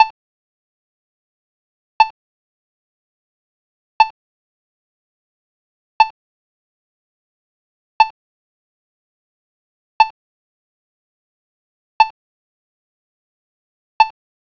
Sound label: Locate Tone At 2 Seconds; accessible pedestrian signals